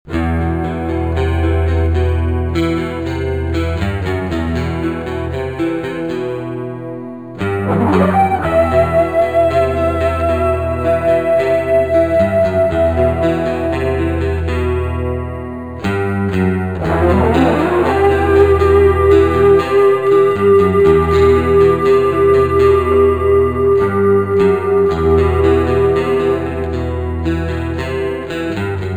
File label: riff with lead